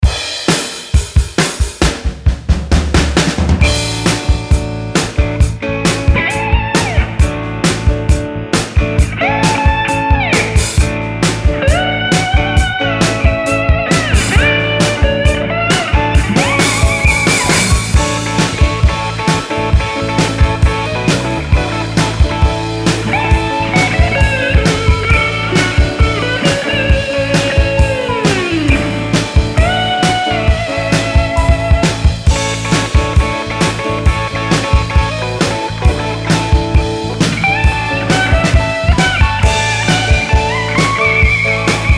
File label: slide solo mix